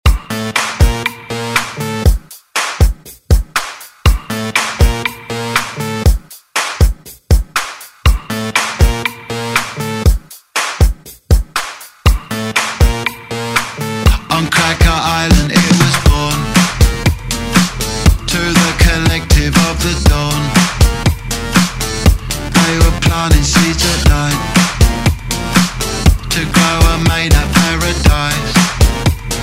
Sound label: Pop Redrum